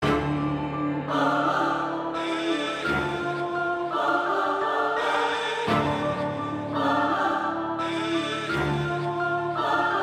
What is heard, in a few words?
Instrumental